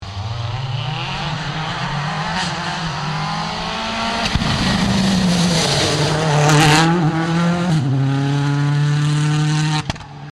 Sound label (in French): 206, rallyes; 206 Grohens